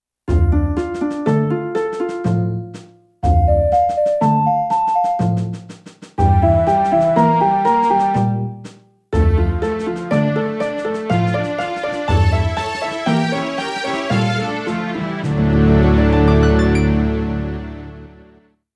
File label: Ocarina; zelda; Ocarina of Time 3D